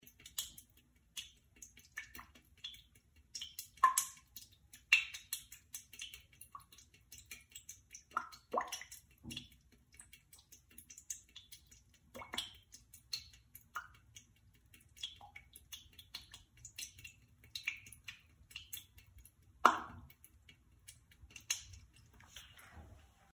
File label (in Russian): zvuk bystryh kapel